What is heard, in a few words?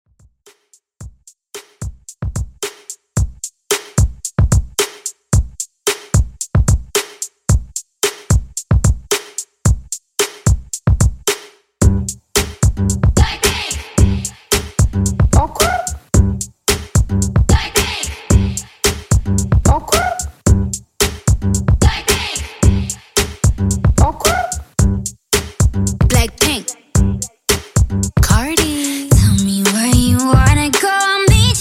Top40 Redrum 111 Bpm